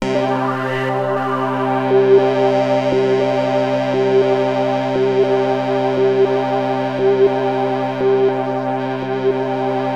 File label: Access Virus B; Synthworx